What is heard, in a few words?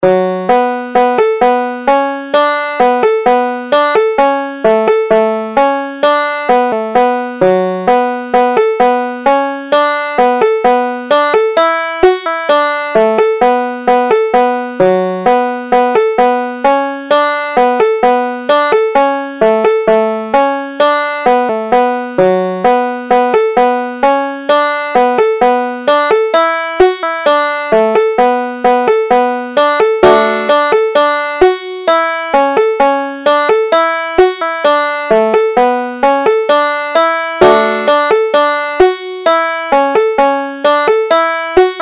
finnish polka, banjo